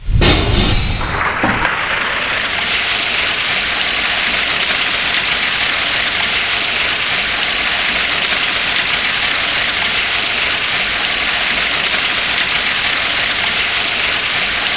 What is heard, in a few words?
water